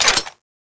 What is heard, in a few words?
rocketpistol shoot; zmall; zm boost; privilege; mboss